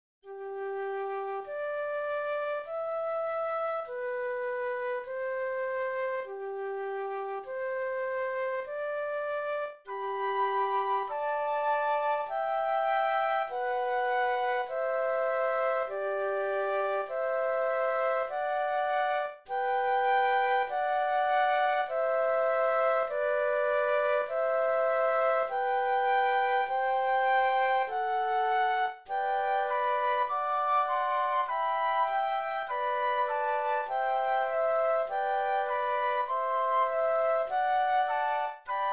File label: flute trio; classical